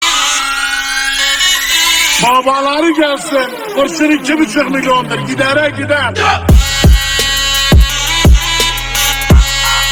beat turkish zurna remix

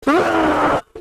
uuuuhhhhrrrr

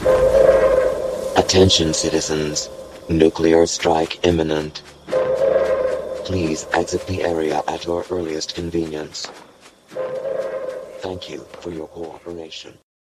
nuke warning; fallout 76; ebs; nuke warning sound effect

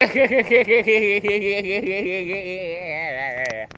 hehehe

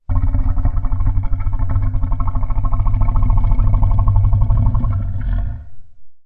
alligator crocodile growl; soundbible

